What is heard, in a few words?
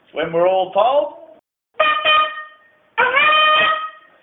all told, calls